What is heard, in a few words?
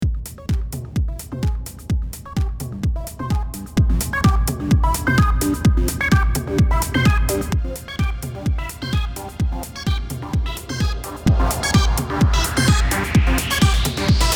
techno